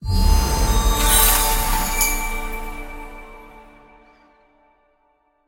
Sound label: wild Spin Intro; wild bazaar